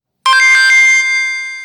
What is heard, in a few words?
Wind Chime